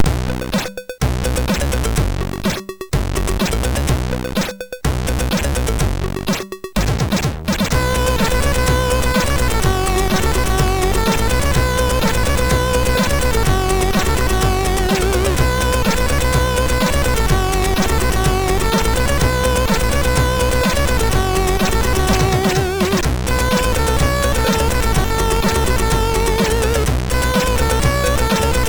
Sounds Cool, MOS6581R3

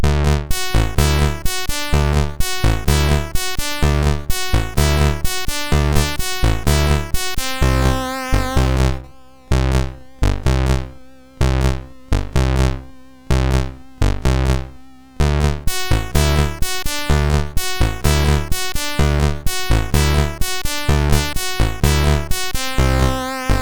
Melody, sid MOS6581R2

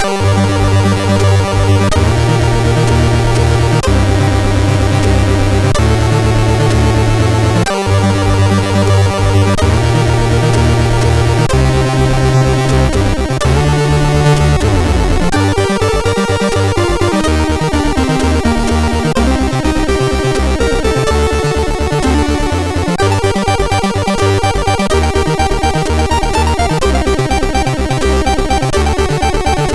Tetris, sid MOS6581R2

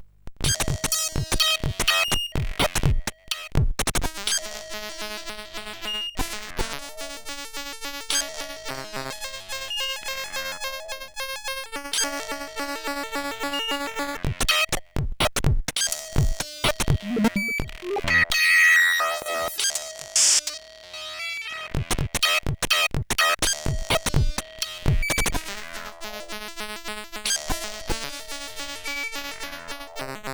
sid CSG8580R5

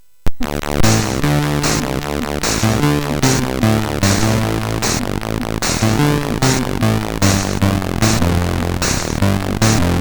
sid, MOS6581R3; Smooth Sound II

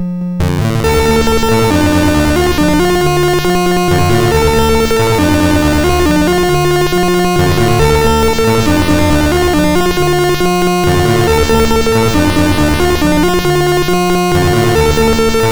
Randomly generated music BASIC, sid MOS6581R2

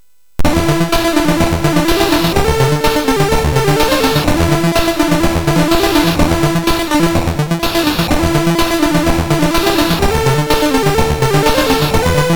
Synth Sample, MOS6581R3; hvsc

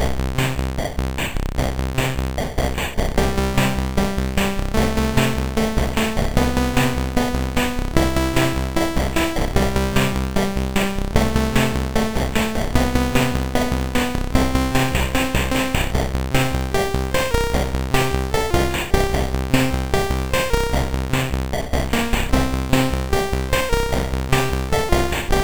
Amiga Emulator, MOS6581R4; Scheepers Marco

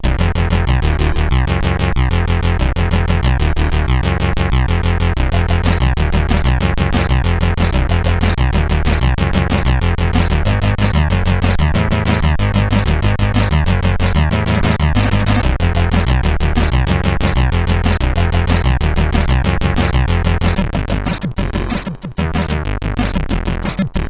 sid 8580; Hyper Cars

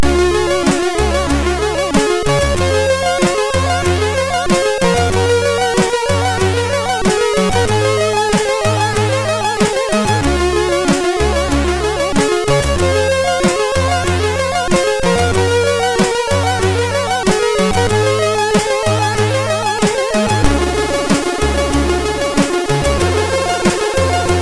rap attack, MOS6581R3

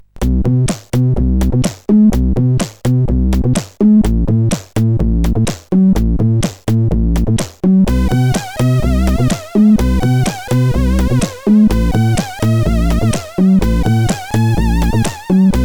Music, CSG8580R5

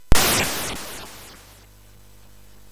Frantic, MOS6581R4

